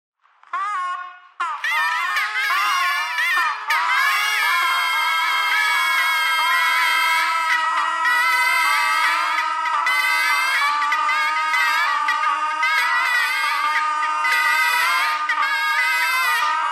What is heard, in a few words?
bagpipe radio bagpipe geese thread noise